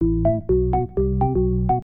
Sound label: ORGAN HOUSE 125 A; eJay; Keys